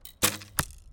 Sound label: Bow shoot